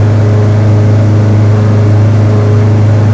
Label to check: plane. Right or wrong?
Right